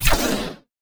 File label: Laser Beam Start